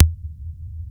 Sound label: TECHNO PERCS; Crypton - DrumBase5000